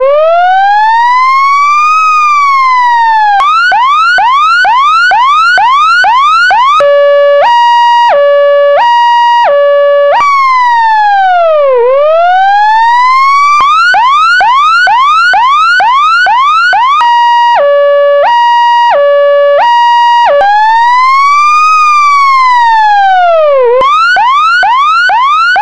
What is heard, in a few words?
emergency electronic siren